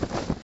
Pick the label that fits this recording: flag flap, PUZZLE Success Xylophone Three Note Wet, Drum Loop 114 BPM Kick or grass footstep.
flag flap